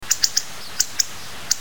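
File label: hawfinch; sime; toritori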